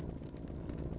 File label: Shrine Light Default